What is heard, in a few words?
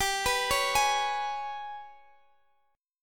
Ab Minor Major 7th Double Flat 5th